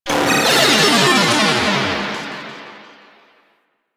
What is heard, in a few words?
Arcade Fail; Nineteeen Ninety Nine; Snake Game